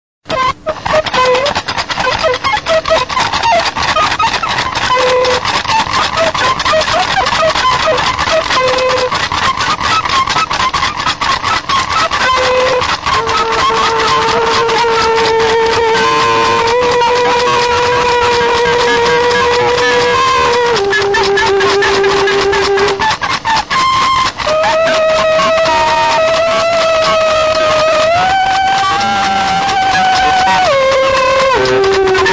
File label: windnoise symphony